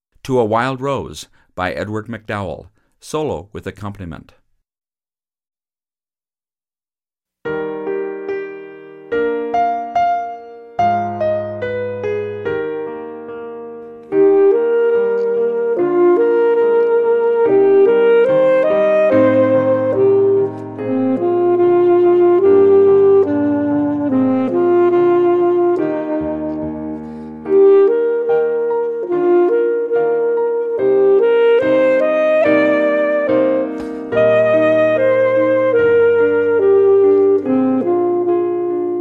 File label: Solo and Accomp; To A Wild Rose